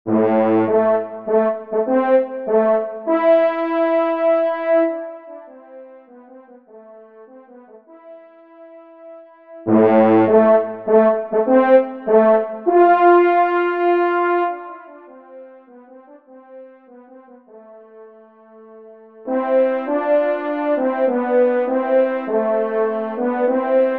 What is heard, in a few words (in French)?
DUO original Si-b Maj; Kling; duo cors n 22